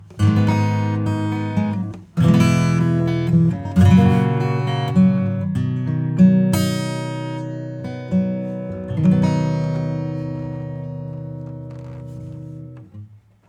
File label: acoustic; Oxygen